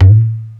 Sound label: TABLA; TABLAS; Ragga & Dub Reggae